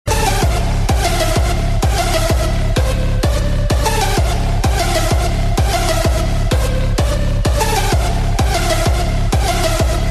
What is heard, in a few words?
hard electro remix